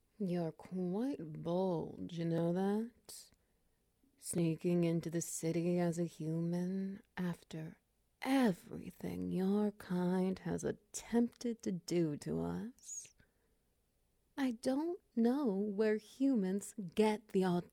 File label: Wet Noises; The Pet Program